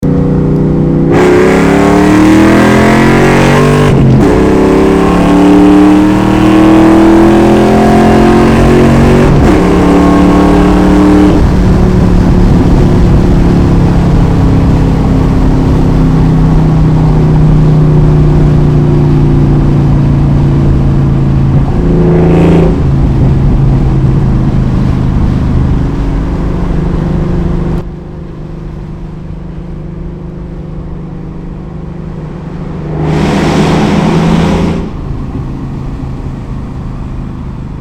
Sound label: Mic on high Acceleration, Cobra BBK Longtubes